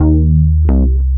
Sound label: bass, Roots Funk; USB Soundscan vol.41; AKAI